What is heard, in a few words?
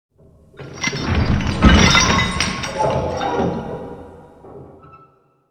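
big chains sweetener close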